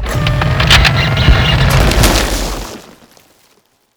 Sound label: ice load; planetoid